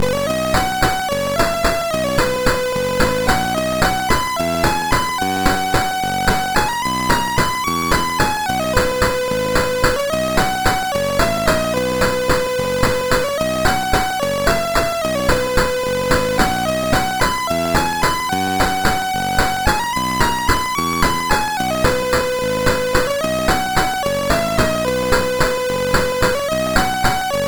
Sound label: sid MOS6581R4, GAMES; Aussie Games